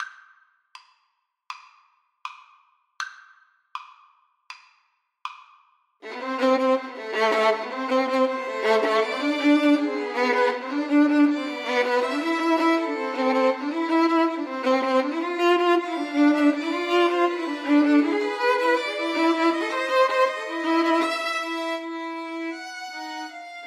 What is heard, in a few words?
confutatis, Violin Trio